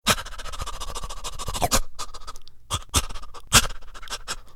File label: dog fish; Poodletooth-iLand